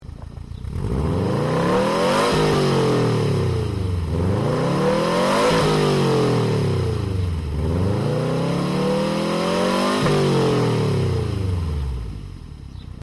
after rev, cat-back; sti

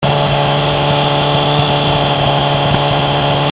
4 5k, cans

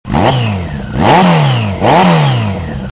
rev, cans

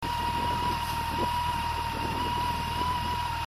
oem idle, cans

